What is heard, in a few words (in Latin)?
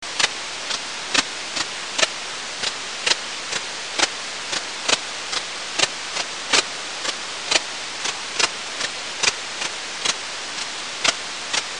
pholidoptera griseoaptera; wirbellos; insekt; heuschrecken